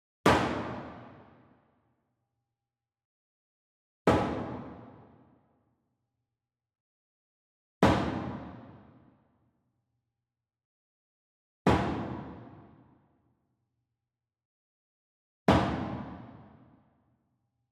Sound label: Sweetener Wood Box Coconut Beater Hit Far; boom library; Cinematic Strikes Construction Kit